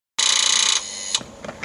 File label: rapid clicking